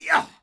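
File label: Cbmn Attack Vox; Civ4 warlords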